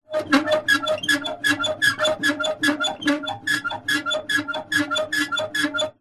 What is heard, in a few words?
industrial lever pump metal old handle pumping med fast squeaks stiff